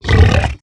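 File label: hoglin angry, 疣猪兽：怒吼; Minecraft; 疣猪兽是一种生成在绯红森林的攻击型生物。它们是目前唯一可繁殖的攻击型生物。; 疣猪兽（我的世界）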